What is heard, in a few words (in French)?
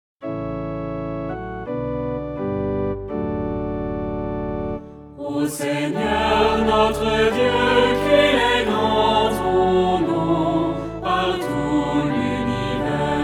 Psaume 8 Alleluia; Jeudi dans l'octave de Paques